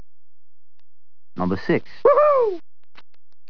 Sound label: Woohoo!; Homer